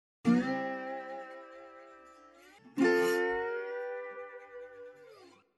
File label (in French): VIRGULE DOBRO LONG